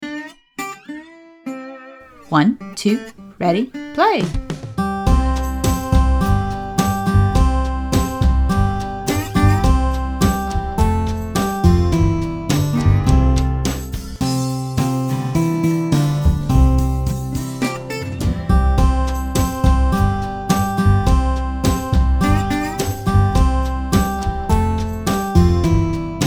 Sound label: Barnyard Song SLOW Tempo WITH INTRO, ukulele